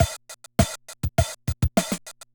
Loop, classic machines, rpm 40

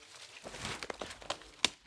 Trudge Step, outdoor, Footsteps

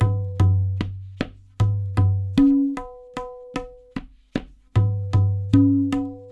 LOOPS, Percussionworks

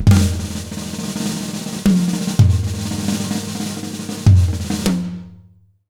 100 B BEAT, Jazz Latin Drumloops

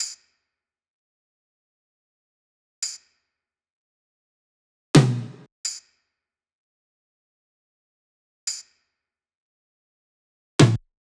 loop perc 170; Seismic Loops

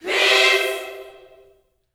peace chord, gospel chord; Vocal Planet Vol1 Gospel

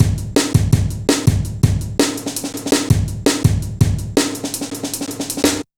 Heavy Live A, Drum Loops, Jungle; Zero-G Jungle Warfare 1, 2 & 3

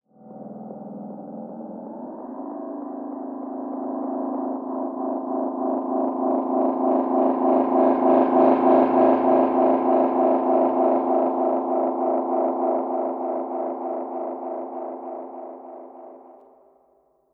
Tape Delay Feedback; radiosonics

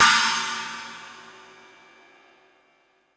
Stadium Rox, Drum Machines, E-MU Pro-Cussion

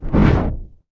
cinematic impact wipe

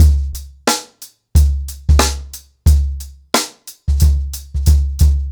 Ripple Effect 90BPM, acoustic drums; The Roots Drumloops

